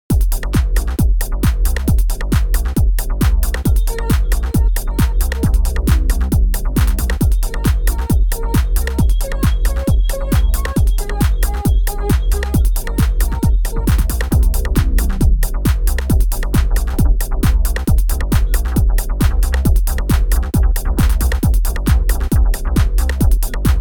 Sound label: Superbeat performance, Emu Xtreme Lead-1